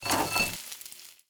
BARRA BRASAS

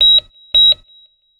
SFX salud media

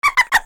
Risa; Winnipeg

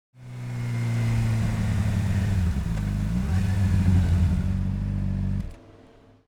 animacion moto puente

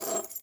coger llave; yesterday origins gamerip 2016 switch ps4 pc ios macos

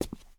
Paso zapato cemento

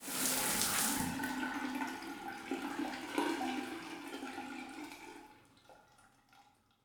DUCHA FINAL; yesterday origins gamerip 2016 switch ps4 pc ios macos

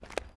Paso sandalia piedra; yesterday origins gamerip 2016 switch ps4 pc ios macos

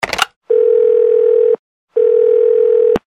Telefono llamar

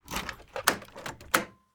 CERRAR PUERTA LLAVE

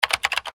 Teclas

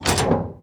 ABRIR PUERTA METAL; Yesterday Origins

